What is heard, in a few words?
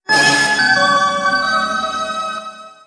Ui Achievement; super sanctum td